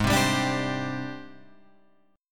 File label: Ab Major Sharp 11th, down, Guitar, Open F; AbM#11 strummed; JGuitar